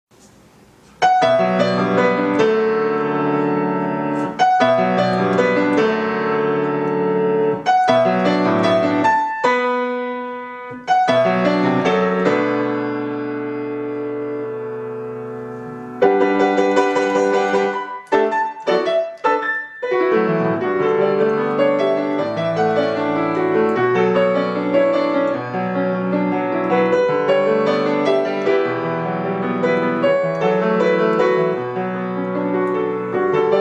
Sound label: piano